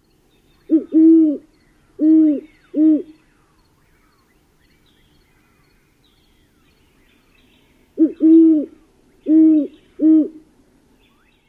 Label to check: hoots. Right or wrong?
Right